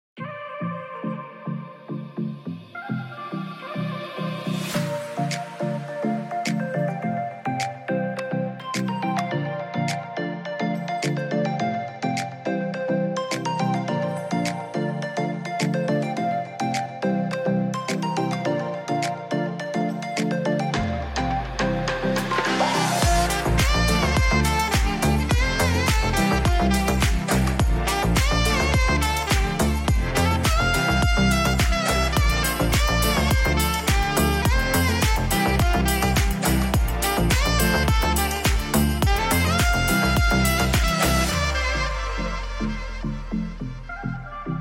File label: Sax House Remix